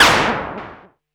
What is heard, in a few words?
Axxe, ARP Axxe